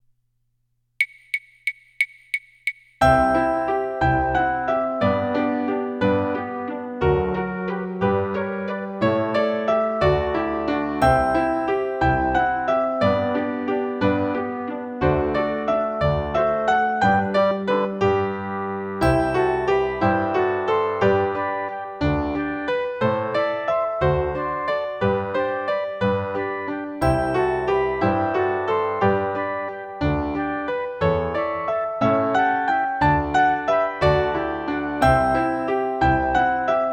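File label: solo with accompaniment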